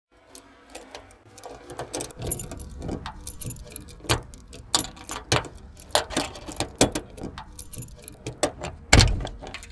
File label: car unlock; Mafie 1